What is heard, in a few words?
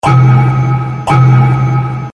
Sms; BMW